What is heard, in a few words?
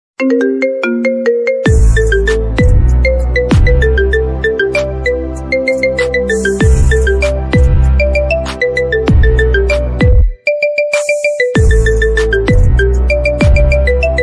Marimba